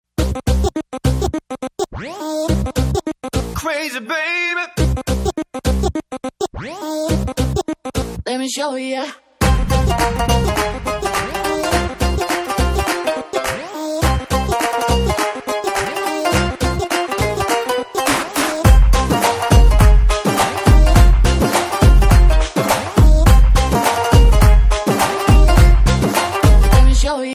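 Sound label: Title background music Telugu Ringtone; Alludu Adhurs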